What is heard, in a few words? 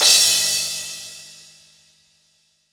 Crash, Cymbals; Roland BK-5